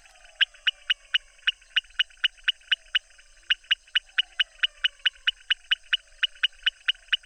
Leptodactylus podicipinus; herpetologia; cantos

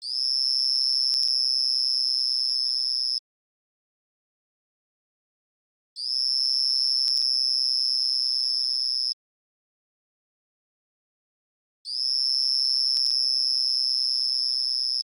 Elachistocleis bicolor, cantos; herpetologia

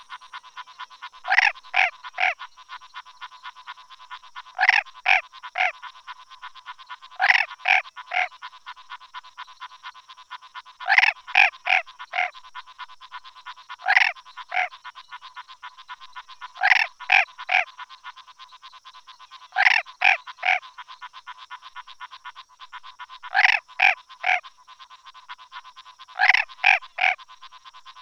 Boana raniceps; herpetologia; cantos

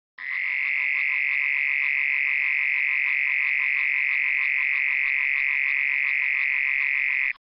Rhinella dorbignyi; herpetologia; cantos

